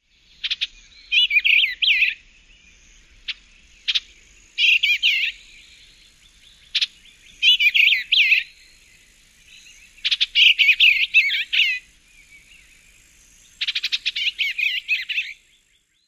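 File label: Eastern Bluebird dawn song with chit calls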